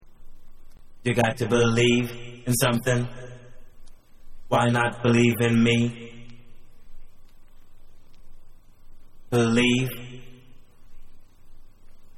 VOCALS

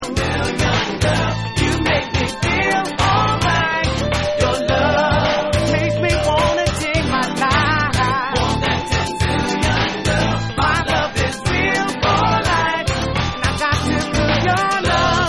Extended Guitar Vocal Mix

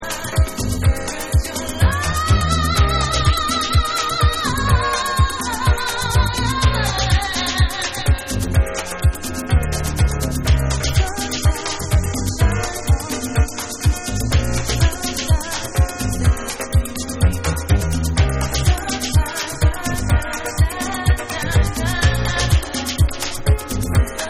Abstract Jazz Lounge

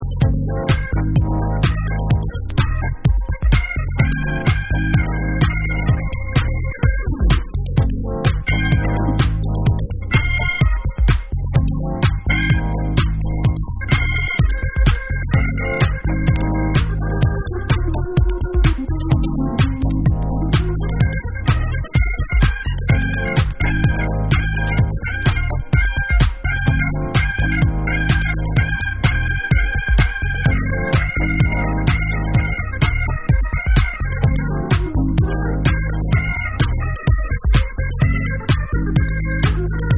ORGAN NIGHTS

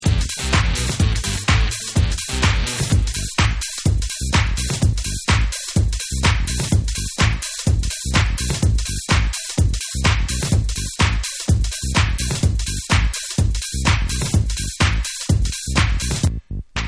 Instrumental

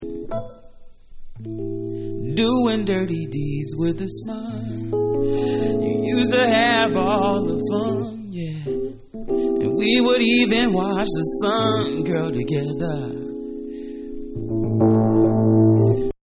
Acapella

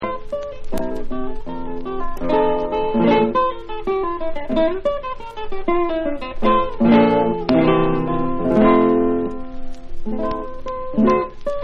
GAVOTTE

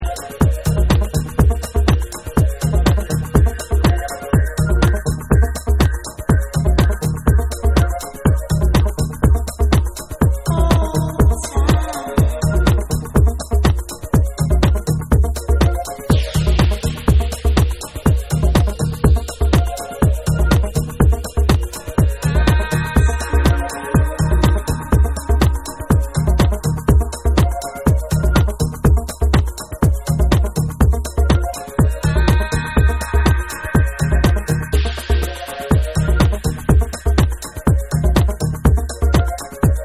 Acid Dub Mix

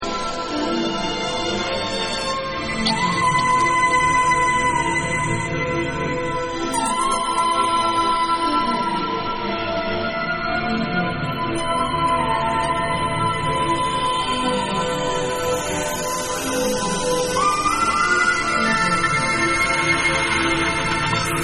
Clouds Ambient Mix

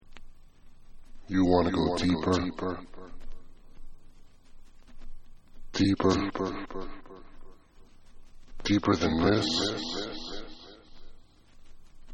Acapella

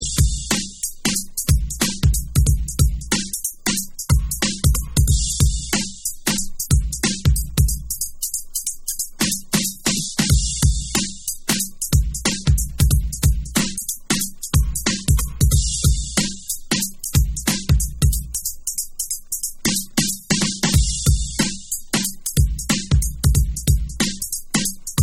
Instrumental Version

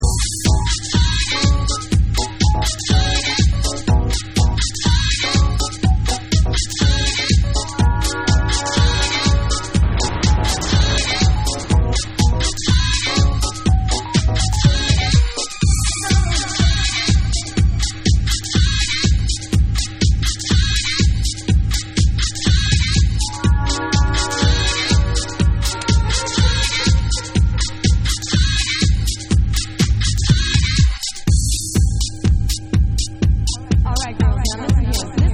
Ragtime Mix

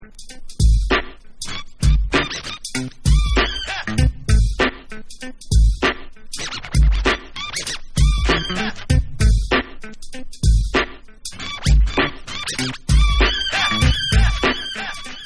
Instrumental Mix

